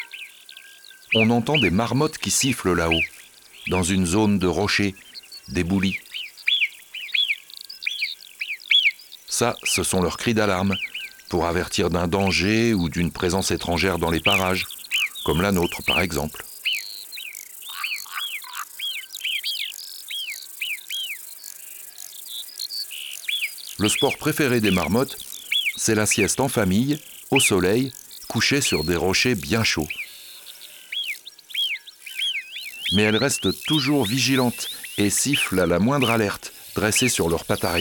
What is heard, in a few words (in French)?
Marmotte; abc de la nature